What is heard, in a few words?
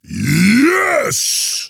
Taking Damage; paladins; Makoa